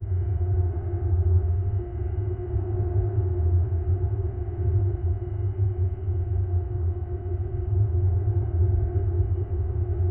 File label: looping almost silence in, spaceship